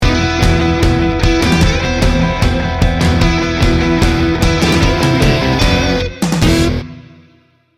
tribal rock sting bumper

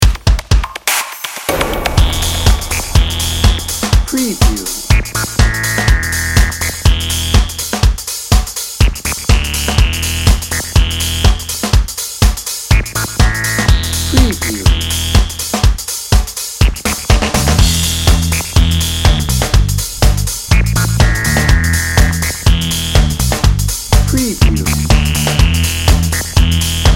dance magic dnb

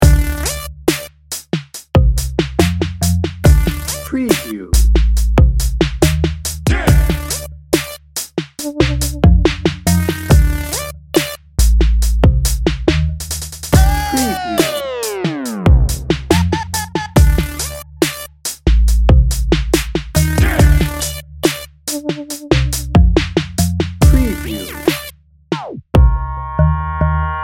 urban culture loop

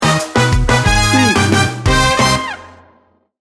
salsa party sting bumper

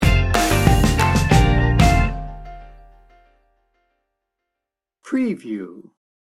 reggae pop star sting bumper

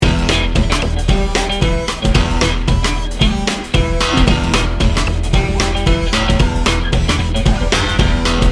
memphis road trip loop

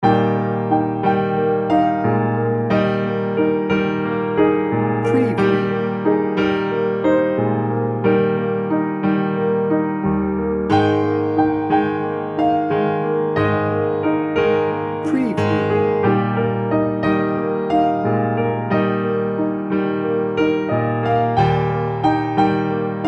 dreamy piano

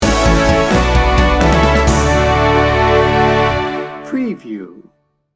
hopeful emotional cinematic sting bumper

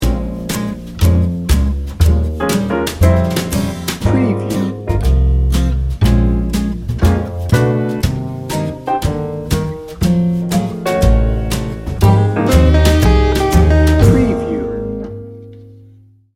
light jazz guitar; Laid back, edgy blues. Featuring a signature acoustic guitar riff; 15 second

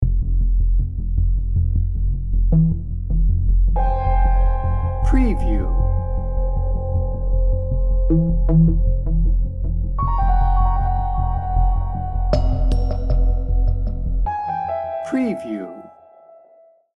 crime and suspense

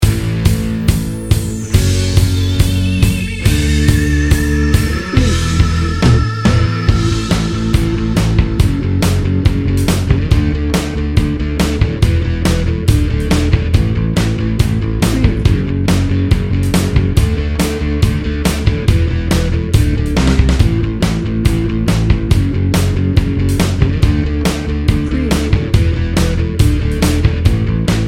happy pop rock bed

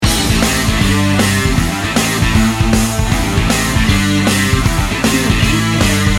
slow fist loop

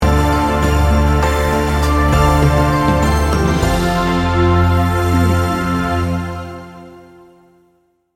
heartbreak sting bumper

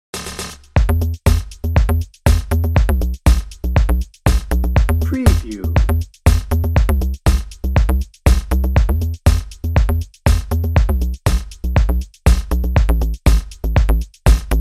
bouncy and dreamy dnb

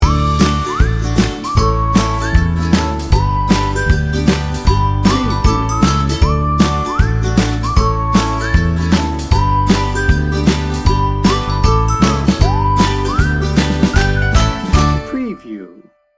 ukulele whistler

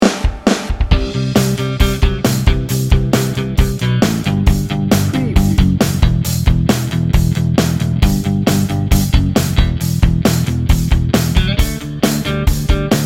bright and driving 30 second

